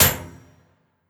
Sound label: Debris Death Hit